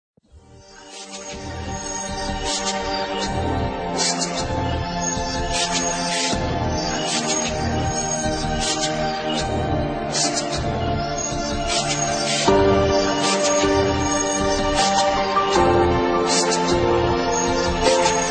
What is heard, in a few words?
instrumental love beats